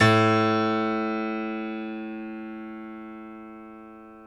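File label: piano, Grand piano; Club-50 - Foundations Roland; YPno 1 S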